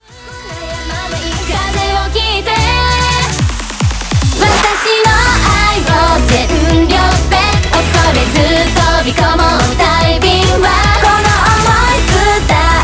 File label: Fanfare dance